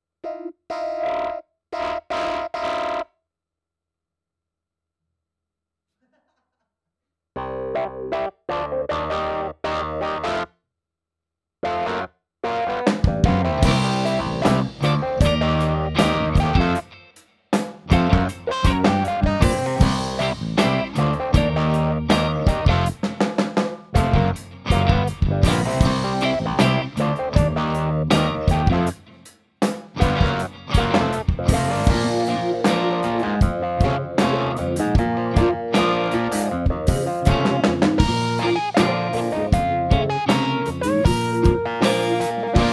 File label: Funk